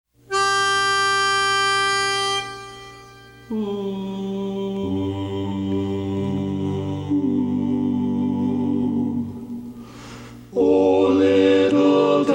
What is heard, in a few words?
tenor, A Capella; o little town of bethlehem